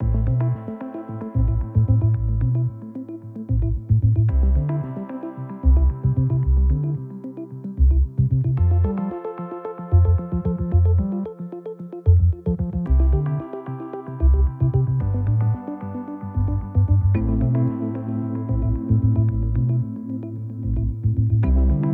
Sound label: Backing Track without click 112bpm